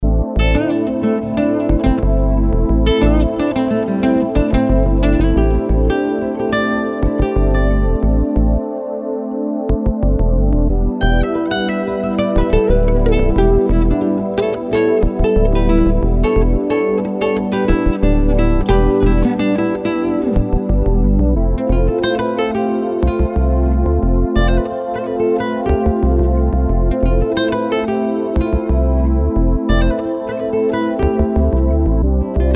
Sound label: BGM